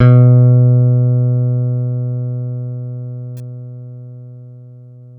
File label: PX PICK B 2, Bass; Masterbits CLIMAX 7; PX BASSES